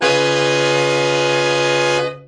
c major hold; Horns and Brass

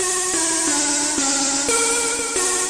hardmelody; remixable collections

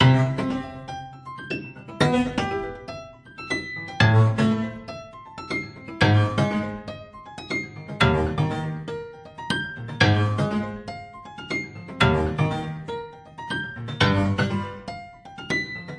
120 happy pianoish arpeggio hard; KEYS ORGAN PIANO LOOPS

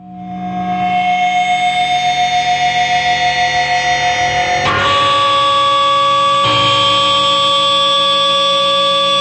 feedback eat my brain

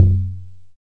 dx100 Tom Toms C1, Yamaha DX100; drum machines

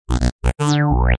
101 bass lick; REMIXABLE COLLECTIONS; Kraddy Collection

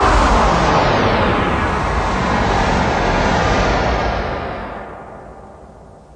Electro FX; Ueb HE Electro FX

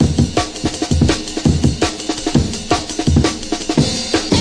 165 classic amen seq, drum loops and breaks; 161 to 180 bpm

